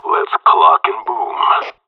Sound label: play button; summer